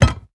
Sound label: Maint On Glass Walk